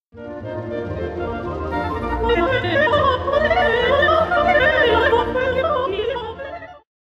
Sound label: opera; mozart